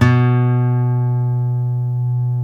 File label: folk steel, guitars; Spectrasonics; Hans Zimmer Guitars VOL-1